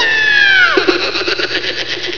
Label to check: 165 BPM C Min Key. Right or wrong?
Wrong